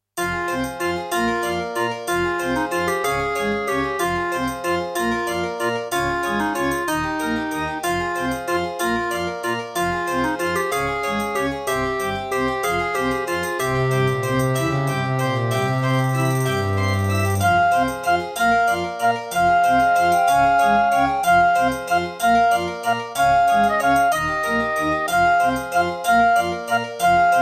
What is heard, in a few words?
Track 6, Roland SC-88 55 MAP; Death Gate